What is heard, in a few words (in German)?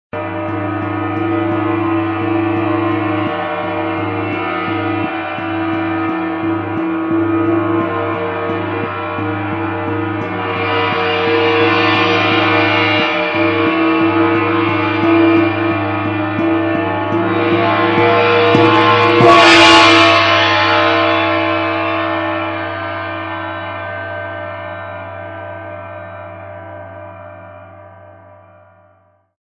bronze gong